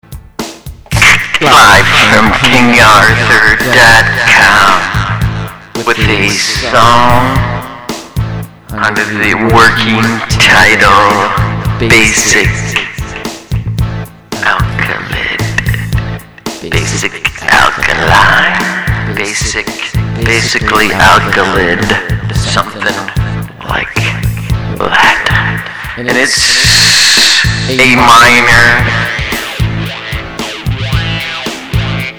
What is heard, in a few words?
Basic Alkaline Soundcheck